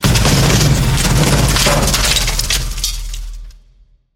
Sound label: metallic crash; soundscrate